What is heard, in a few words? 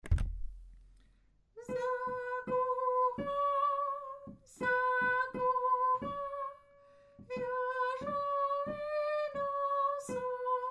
soprano; sakura